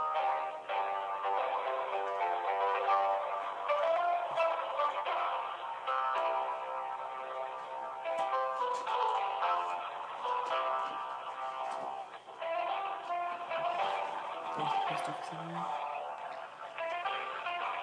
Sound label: hsbc hold music; please god could someone ask hsbc to change their hold music